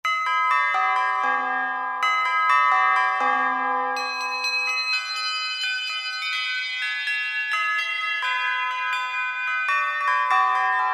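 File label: Tubular Bells, Roland SR-JV80 Orchestral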